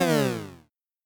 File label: Retro Game Weapons Sound Effects